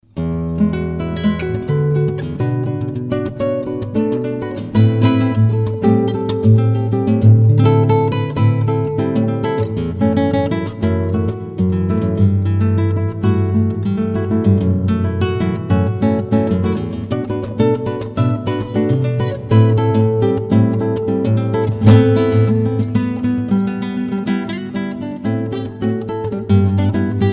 Elegant Latin Solo Guitar; Flamenco Brazilian Classical Guitarist

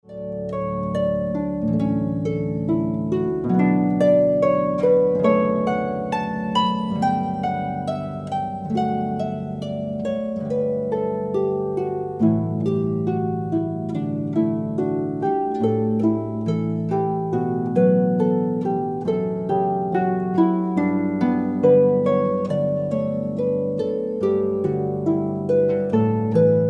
Canon in D. Pachelbel, harp

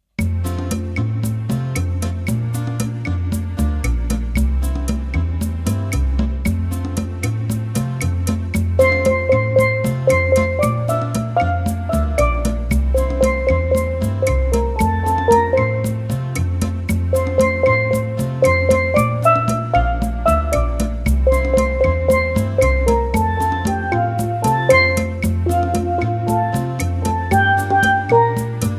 solo, Caribbean; farewell

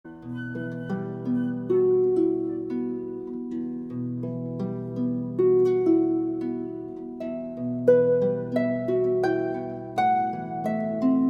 Lullaby, Harp

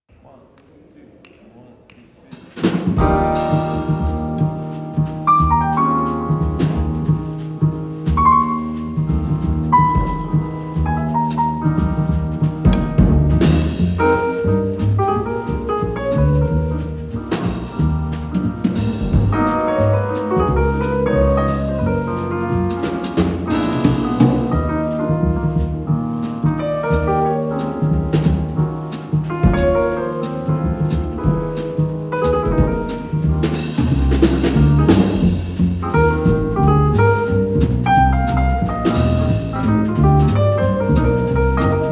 jazz trio; liveband

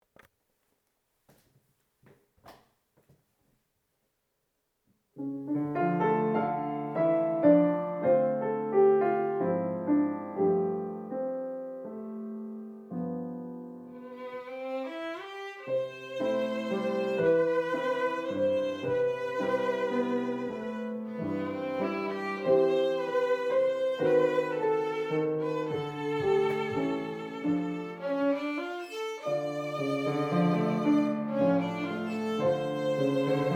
classical duo

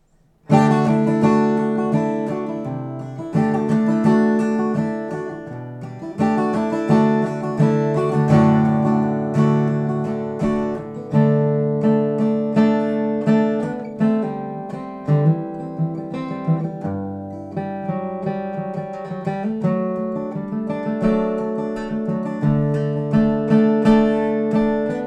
Solo Guitar; acoustic guitar

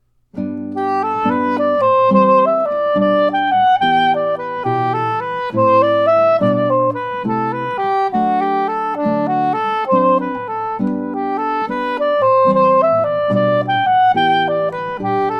Sax And Guitar Duo; Jesu Joy Of Mans Desiring (Bach)

